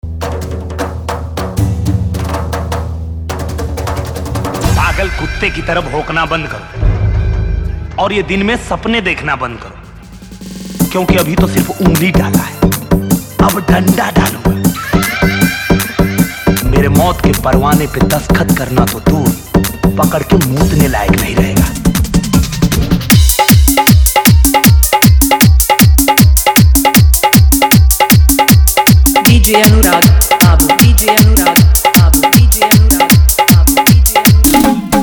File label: Old Bhojpuri Fully Dhollki Bass Dance